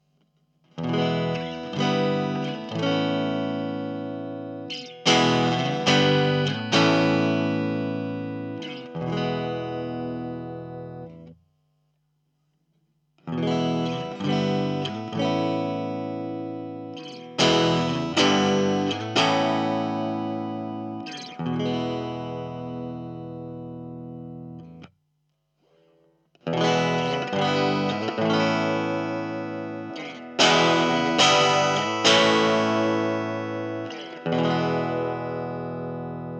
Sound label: clean; FENDER SQUIER VINTAGE MODIFIED 51 TELE